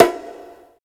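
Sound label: reverb CONGA; East West - Phat & Phunky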